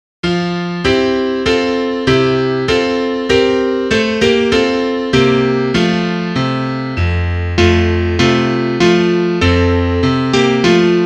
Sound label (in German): Klavier; Liederbuch; wenn ich ein voeglein waer